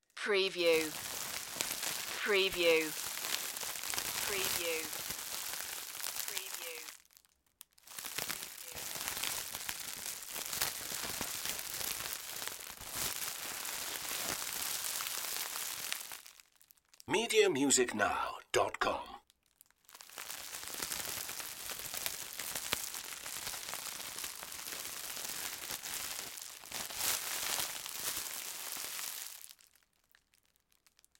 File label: plastic bag movement